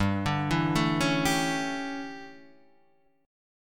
D Major 7th Suspended 2nd Suspended 4th

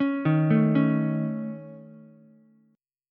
C sharp Suspended 2nd Sharp 5th C sharp Ukulele; C#sus2#5; arpeggiated; JGuitar

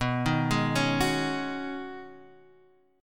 B 6th Add 9th B down Guitar Half Step Down

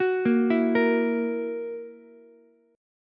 E Suspended 2nd Flat 5th E down Ukulele; arpeggiated; JGuitar

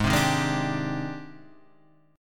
Ab Major Sharp 11th Ab down Guitar Half Step Down; AbM#11

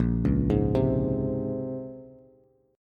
Bb Minor 9th Bb, Bass; Bbm9; arpeggiated; 6,4,6,5; JGuitar

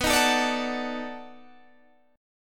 B 7th Sharp 9th, down, Guitar, DADGAD

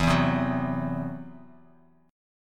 E Suspended 2nd Sharp 5th E down Bass Standard; Esus2#5; strummed; JGuitar

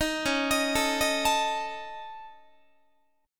Db Suspended 2nd Sharp 5th Db Guitar; arpeggiated; Dbsus2#5; JGuitar

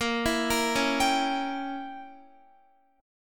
A sharp Diminished 7th Guitar, chord; A#dim7; arpeggiated; x,13,14,15,13,14

